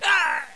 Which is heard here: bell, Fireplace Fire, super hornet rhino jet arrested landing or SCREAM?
SCREAM